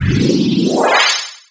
teleport activate; Star Wars Clone Wars; XBOX; Xbox Live Effects

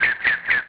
amb bird; Tony Hawk's Project 8; XBOX

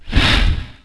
Sniff, Dino

